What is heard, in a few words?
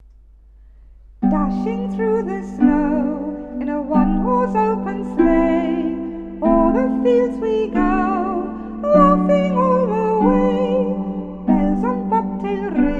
Jingle Bells, Christmas Carols